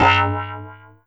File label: BOING, Anime Sound FX; COMIC